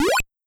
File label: Boip